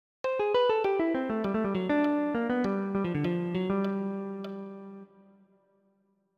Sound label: Lick